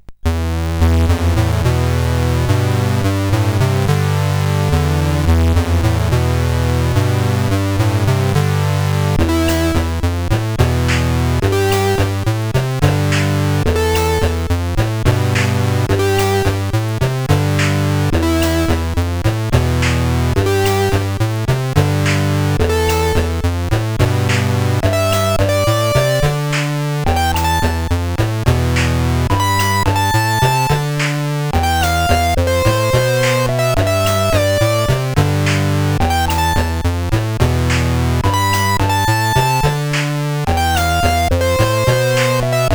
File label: T-Rex 64, CSG8580R5